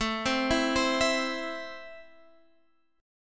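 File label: A Minor, Guitar Standard, chord strum; 17,15,14,17,17,x; JGuitar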